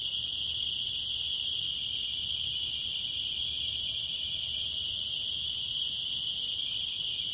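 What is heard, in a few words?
ambience desert crickets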